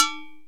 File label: Tonk